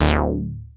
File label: ARP 2600 D3, synthesizer; Hollywood Edge - Giorgio Moroder Rare Synthesizer Collection